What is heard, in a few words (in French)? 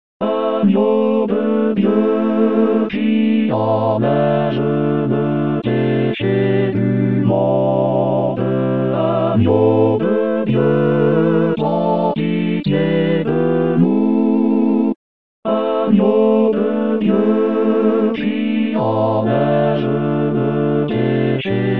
Agneau De Dieu, Chorale